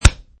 Chest Punch; Martial arts - chest punch; Punch And Hit Sound Effects; Punches and Hits; Combat

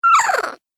Cartoon Gremlin Happy; Cartoon Voice Sound Effects

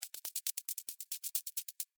Spider Walk Sand, Spider, Walk, Sand, Arachnid; Animals:Insects; Insect, Bug; Spiders; Blastwave

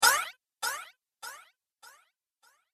Thin Rising Hit Sound With Delay; Miscellaneous Impact Sound Effects; Andrew Fleming

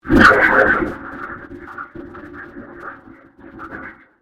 Convolution Surge

